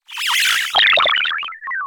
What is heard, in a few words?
Reward; habitica; farvoid Theme